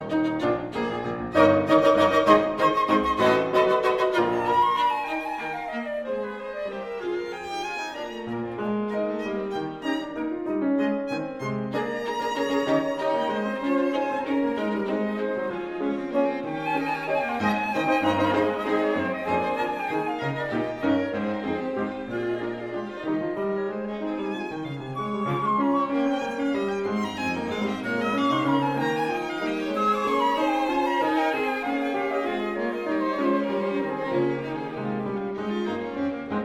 suite dans le style ancien for flute violin viola and piano fuguette